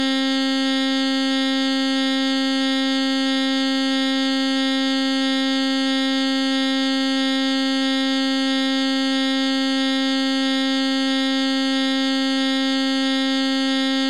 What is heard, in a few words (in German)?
Ton loop; harmonie; psycho